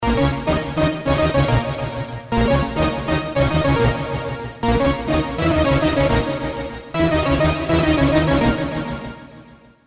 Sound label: synth brasso, Roland JD800; SYNTH brass 8 bits